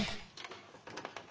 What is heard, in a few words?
Shitting large pause Music; Tom